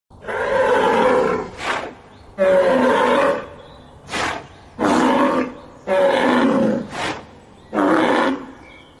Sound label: bull braying